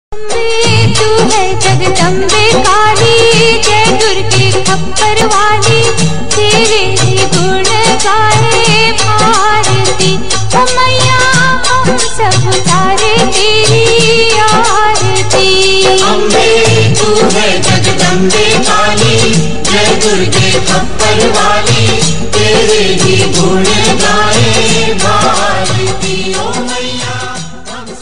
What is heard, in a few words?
ambe tu hai jagdambe kali ringtone durga mata aarti bhakti ringtone